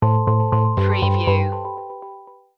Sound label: starry lights stinger